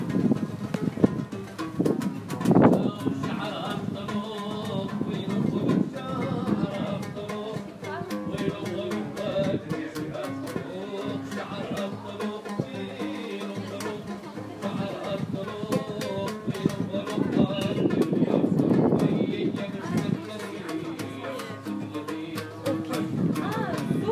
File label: jam